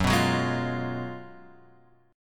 F sharp Major 7th Suspended 2nd Suspended 4th; F#M7sus2sus4 Chord {2 2 4 1 X 1} strummed

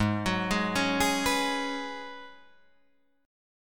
G sharp Minor 11th G sharp Guitar Drop D, chord

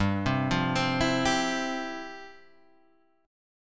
F Major 7th Suspended 2nd

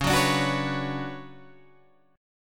D Major 13th Db, chord

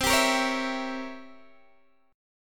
C 7th Flat 9th C down Guitar Open D

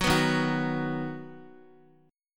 Eb Minor Sharp 5th, Guitar, Ebm#5 chord; strummed; JGuitar

